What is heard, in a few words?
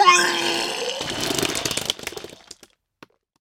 Man Throwing Up